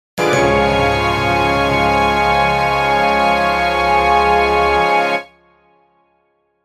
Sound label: orchestral boom